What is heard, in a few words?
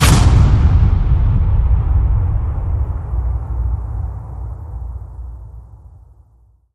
Hit Big Strong Hammer